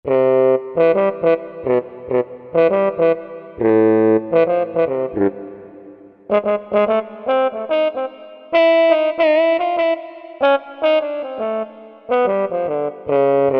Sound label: Mutrom Bomb, Korg MSC-11S Brass